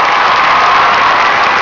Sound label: CHEER; kansei